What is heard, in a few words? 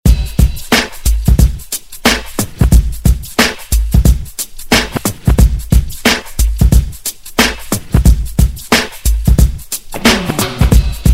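Reggae Extended Redrum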